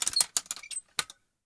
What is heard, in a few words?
mechanism clicks clock; soundmorph